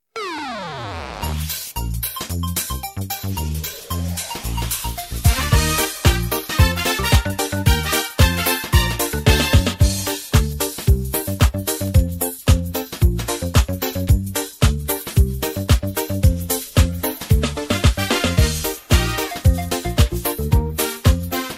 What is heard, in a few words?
instrumental